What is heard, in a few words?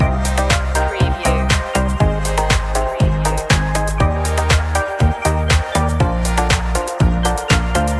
white beach loop